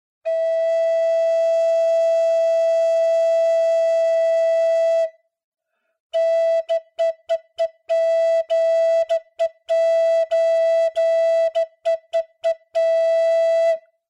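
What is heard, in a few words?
E American Flute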